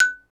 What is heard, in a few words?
XYLO; Roland - LA Composer vol. 1